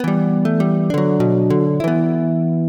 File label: Bard Melody